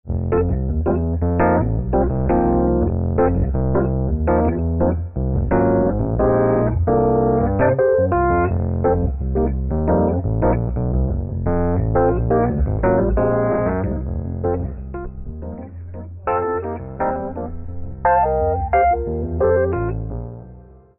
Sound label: Fender Twin Reverb, fender rhodes